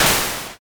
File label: splash